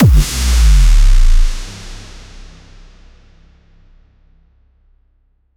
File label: massive bass drop impact, dubstep